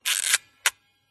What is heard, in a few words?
smena symbol camera wind film lever; mkh8060; pmsfx